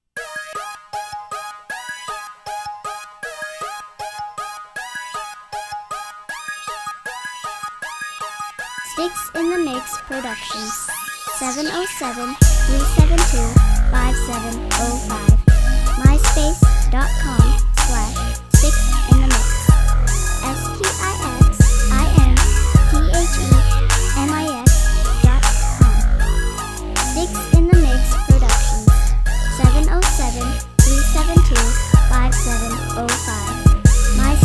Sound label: crunk